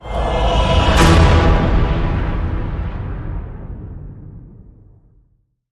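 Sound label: Hit Orchestral Cave Men E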